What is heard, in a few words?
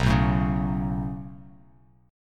B 7th Suspended 2nd, Bass Standard; B7sus2; strummed; JGuitar